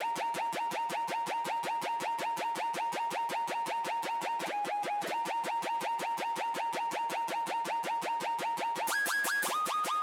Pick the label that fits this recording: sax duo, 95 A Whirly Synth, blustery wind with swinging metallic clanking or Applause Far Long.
95 A Whirly Synth